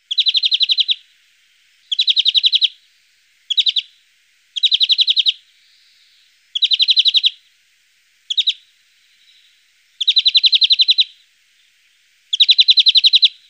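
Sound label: obyknovennaya zelenushka golos, European greenfinch voice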